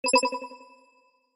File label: click effect